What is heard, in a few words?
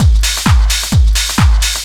drum 130 groove; Techno Trance Essentials